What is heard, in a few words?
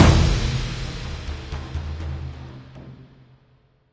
Death Stinger; runic rampage